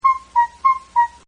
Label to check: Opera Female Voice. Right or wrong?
Right